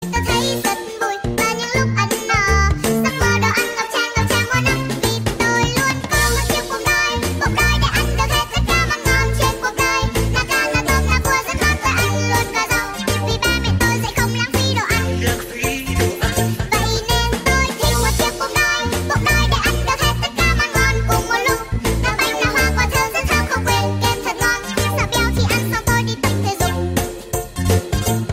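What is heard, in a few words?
ringtone remix; TikTok; nhac chuong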